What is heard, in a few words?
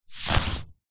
warfare knife dig push slow into grass mud deep